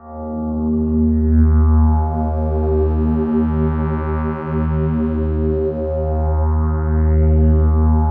PAD, Drum & Bass, 150 BPM

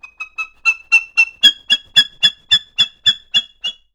bird calls, percussion

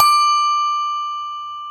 BELL D, TOY HANDBELL; AKAI S-Series CD-ROM Sound Library VOL-7

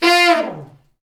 BRS, Fat Falls; Roland L-CD702; Fat Section

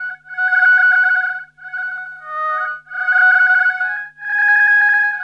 SYNT, Hip-Hop & R&B; USB Soundscan vol.47; AKAI

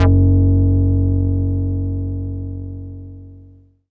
Funkster f#2, Korg Poly-800

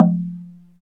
Slit Drums, Africa, Wood & Metal

